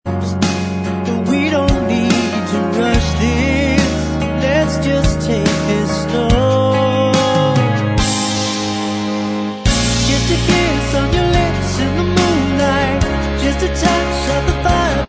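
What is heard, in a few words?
instrumental, backing tracks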